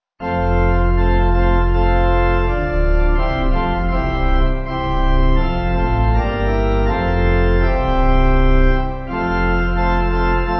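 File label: Pipe, hymn backing; O God Of Truth; Marlow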